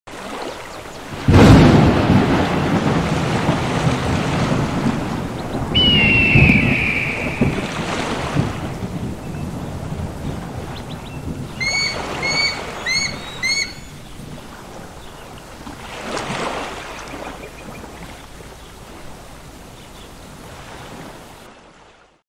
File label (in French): Alarme Du Ciel